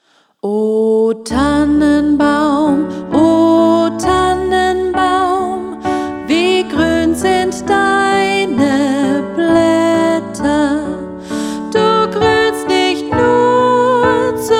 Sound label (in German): O Tannenbaum, Pop Piano; Pop Piano Patterns